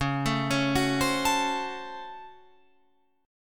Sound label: Db Major 7th Suspended 4th Sharp 5th, Guitar, Open F; arpeggiated